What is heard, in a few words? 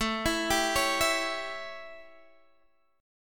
A 7th Guitar Drop D, chord